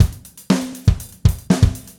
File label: Gretsch groove 90 BPM, 1967 Gretsch Kit drums